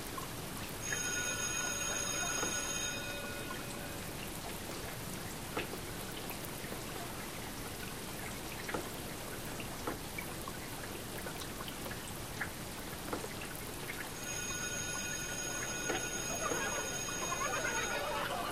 Back to School Ambience

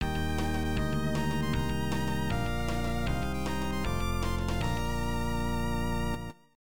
(electone); Great Sluggers 94 New World Stadium; namco nb 1; arcade

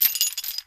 Chain Collision

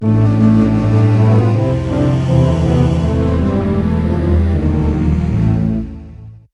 loss; Mobilising Armies; RuneScape 3

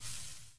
Fuse Hiss; Doodle Jump 2

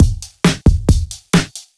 Music Singing; Littlest Pet Shop City Friends Beach Friends Country Friends

